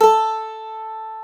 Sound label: mandolin, Stereo Mandolin; E-MU Producer Series Vol. 2; More Studio Essentials; Composer